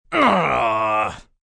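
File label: Growl Grunt male, Growl, male, grunt; Groaning Sound Effects; human voice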